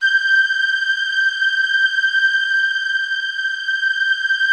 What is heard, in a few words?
G5, STRINGS, Yamaha VP-1; Music Store; JODYSTRINGS